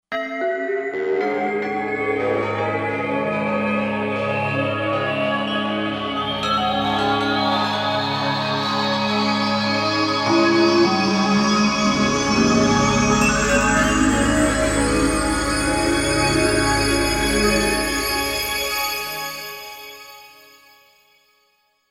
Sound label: Fx Rising High